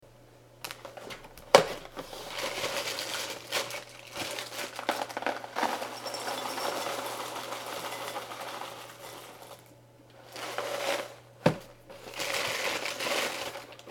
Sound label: cereal raisinbran